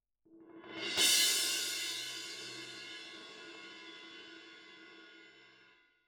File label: Perc